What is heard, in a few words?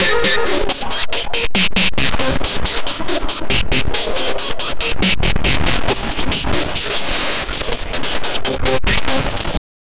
knees twisting with leath; leather